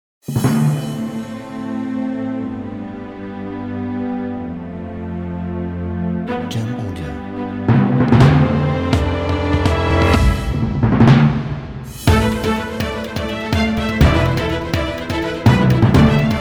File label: Epic Cinematic Trailer